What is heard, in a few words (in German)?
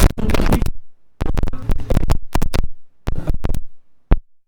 London, Mikrophon Fehler